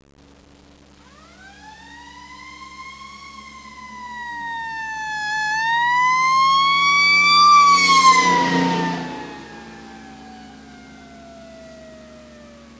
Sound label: siren, fire; disaster